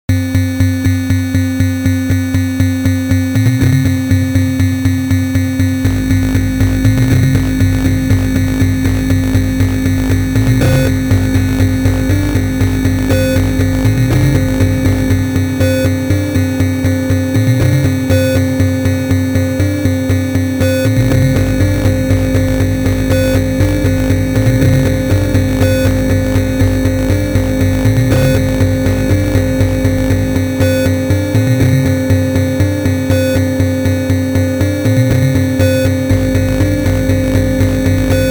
Pulsing

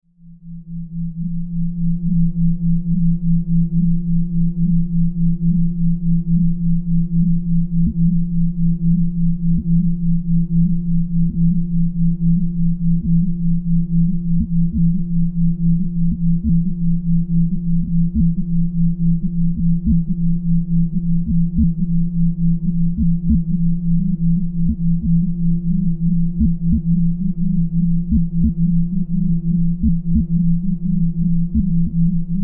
Simple Sine

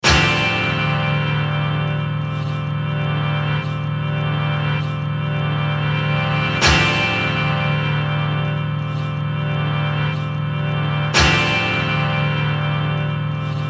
Hard Chord

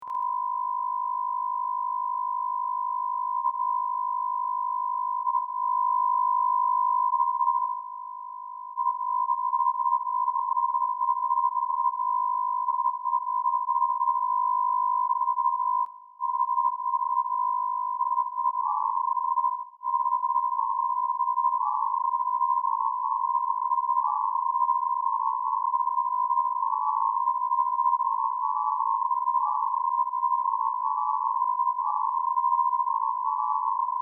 Simple Sine

